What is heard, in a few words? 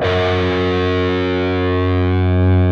PRS G, Guitars, LEAD